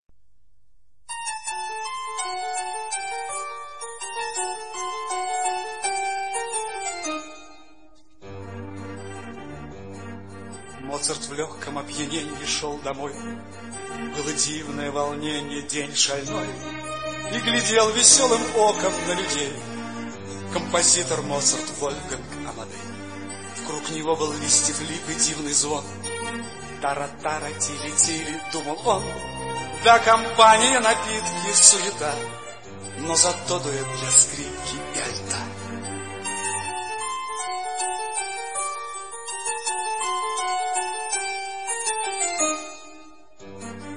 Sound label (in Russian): Duet dlya skripki i alta; D.Samoylov